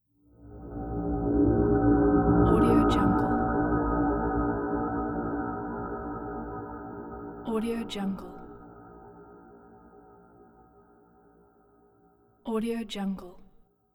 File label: dark horror impact